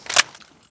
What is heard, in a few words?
usp clip in, weapons; garrysmod